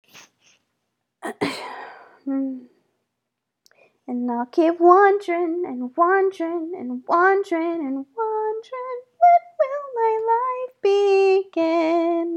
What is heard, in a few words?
Vocal Warmups